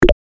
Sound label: selection sound